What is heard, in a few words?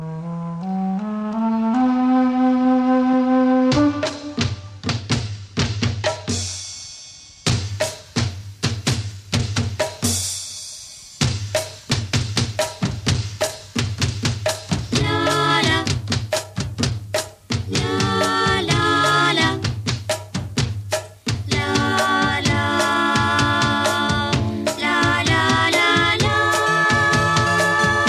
Music